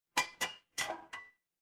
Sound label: glass ceramic